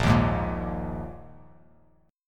A sharp 7th Suspended 4th down; strummed; JGuitar

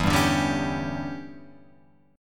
E Minor 13th guitar chord, Open F; Em13 strummed; JGuitar

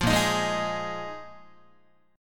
A sharp 7th Suspended 4th, Guitar; strummed; JGuitar

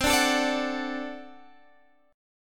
D 7th, C

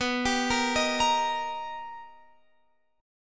B Augmented Major 7th B Guitar Open F; B+M7; arpeggiated; x,18,19,17,18,17; JGuitar

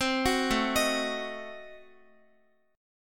A Diminished 7th A down Guitar; arpeggiated; tuning=4E,4A,5D,5G,5C,6F

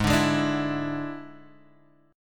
D Suspended 2nd Flat 5th G sharp

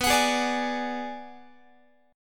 B Minor 6th B, Guitar; Bm6 strummed

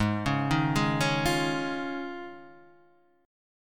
D sharp 7th Suspended 2nd Suspended 4th G sharp

